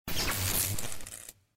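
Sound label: Background Left Sparks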